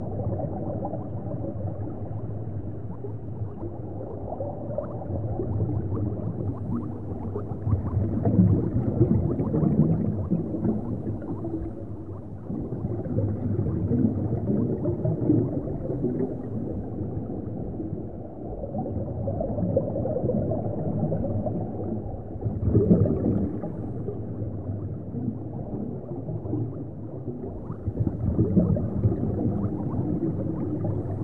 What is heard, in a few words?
Underwater; Club Penguin Online Unofficial Soundtrack